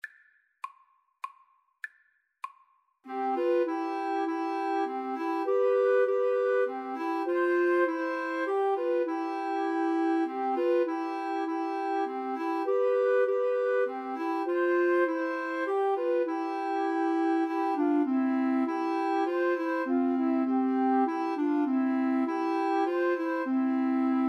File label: come thou fount, 2 Flutes Clarinet